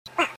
whoa

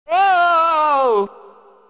toad despair

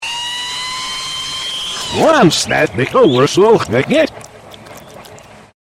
reee; reverse

